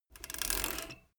bmx bike pedal brake very short

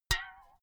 filled can hit hard ringing

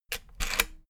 rotary control plastic rattle turn

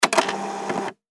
fax machine

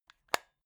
plastic remote battery compartment close

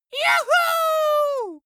dwarf cheer "juhu"

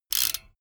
bmx bike crank freewheel chopped short

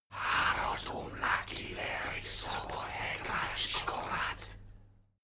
male crowd evoke whisper

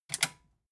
metal button click power off fast

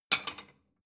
plate small shelve

